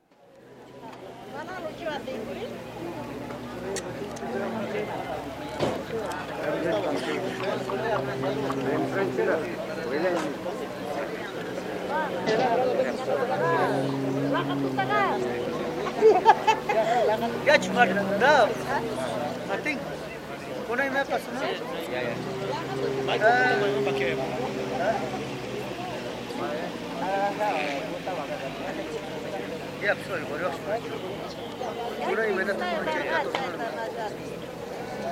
Outdoor market, Cliza, small town in central Bolivia with livestock noise, also some muted chatter and traffic noise; Stores And Mall; BBC